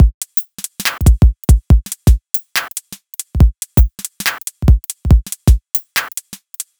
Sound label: Aether Break, Bpm 141, Dubstep, Drum Loops